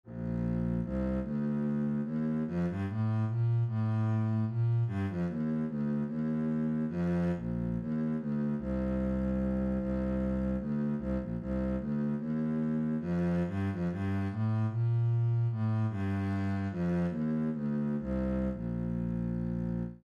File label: minuet bass